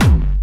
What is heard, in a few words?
kicks